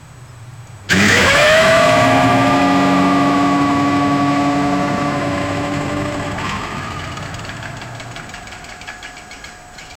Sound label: bike chain pedal start